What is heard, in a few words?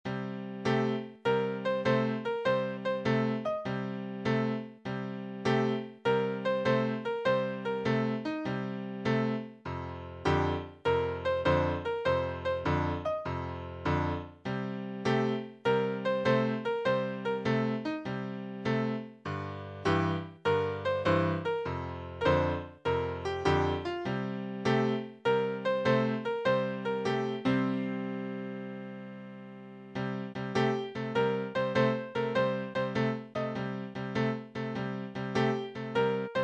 walking power chords in blues form